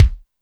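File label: kick Studio, Alesis D4; 300 Drum Machines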